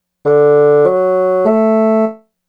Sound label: bassoon